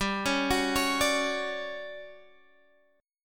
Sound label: D-Major 7th G; DM7/G arpeggiated